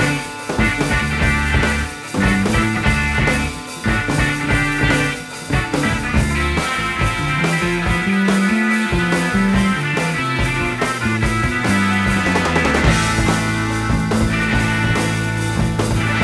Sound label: rock